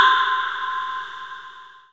Snare, Korg Z1

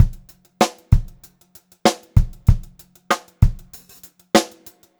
Pop-Rock Drumloops; 96 POP 2

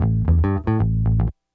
Disco Bass, 70s Analog Funk; Sonic Foundry (Sony Creative Software); What it Is! 70s Analog Funk

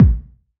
BD, BASS DRUMS; EdgeSounds

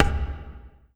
WD R, DRM HITS; Spectrasonics; Liquid Grooves

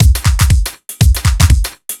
Kit 2 Heavy Swing 120; off the grid

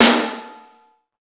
SNR, Roland; Real Snrs

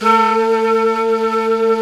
Flute, Singin' Flute

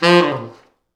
T Sax falls, Horns Sax

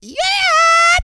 YELL, MALE HUMAN; Spectrasonics Vocal Planet

